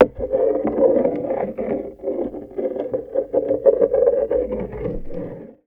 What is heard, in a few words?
In The Bath, Marbles, contact mic; musicradar; contact mic madness; Raw